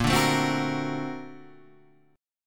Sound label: A sharp Augmented Major 9th, chord; strummed; JGuitar